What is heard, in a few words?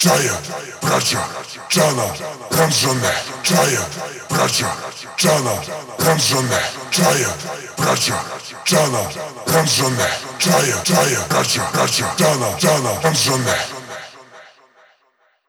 138 Shamanic Voice (Wet), Psytrance